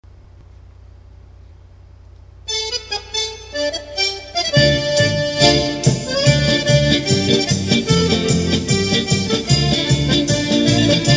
Israel Horo'a Haktana, Folk Dances; Petaluma; 1st String Folk Dances; Israeli

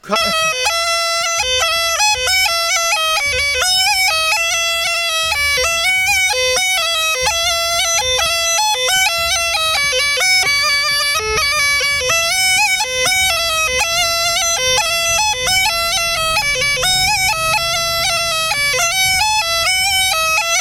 Small Gajda, Slowly

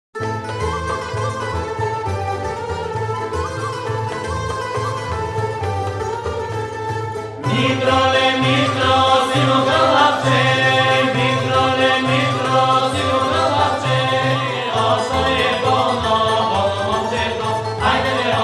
Bulgaria Dramskoto, Folk Dances; Petaluma; 2nd String Folk Dances

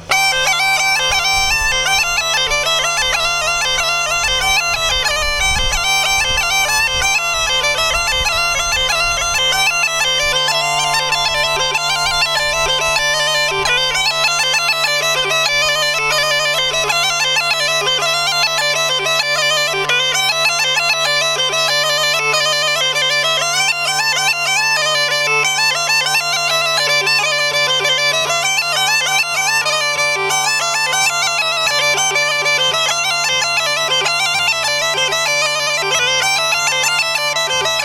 Nestinarsko Additional Melodies, Small Gajda

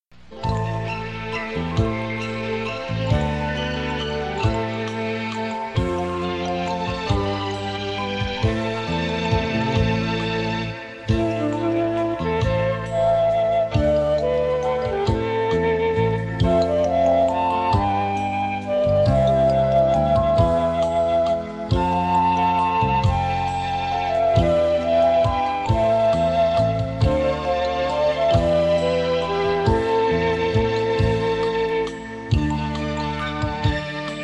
Hong Kong Waves, Folk Dances; Petaluma; 1st String Folk Dances

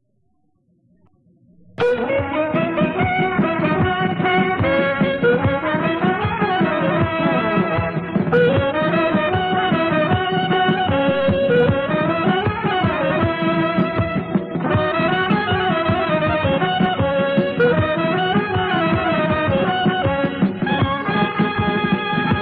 Macedonia Syrtos, Folk Dances; Petaluma; 1st String Folk Dances; Macedonian

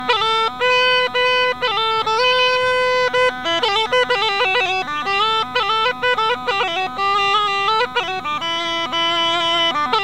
Instrumental version + break, Rodope Gajada; Lesson Recordings; Vasil - Rodope Gajada Lessons

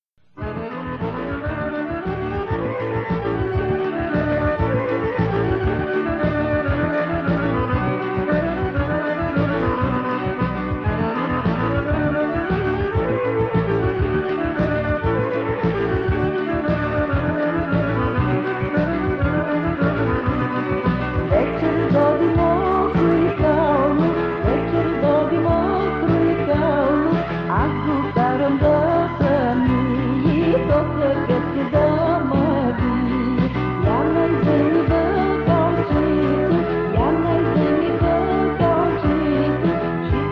Bulgaria, Folk Dances; Brasni Carvul; Petaluma; 1st String Folk Dances; Bulgarian

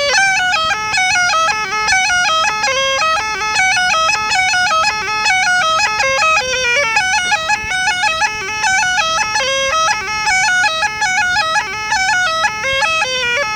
Varnenski 9 melody major, Small Gajda

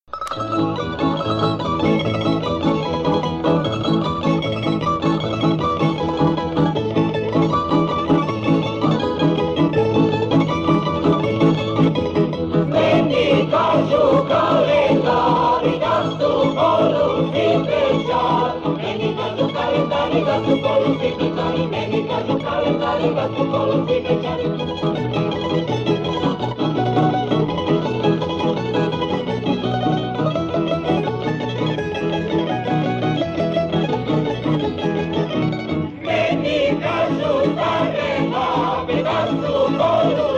Croatia Kolo Kalendara, Folk Dances; Petaluma; 1st String Folk Dances; Croatian

